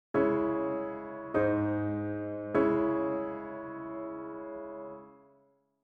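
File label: C sharp minor PAC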